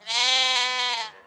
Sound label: Sheep Panic